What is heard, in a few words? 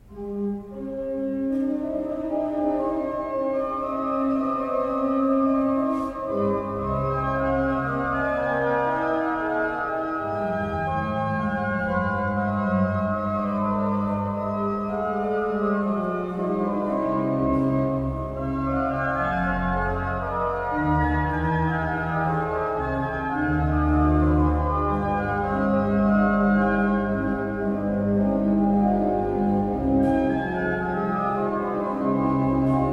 organ; bach